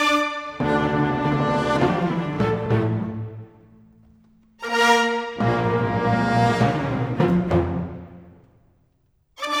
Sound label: ORCHESTRAL